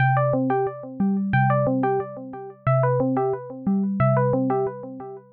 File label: ringtone recreation; discord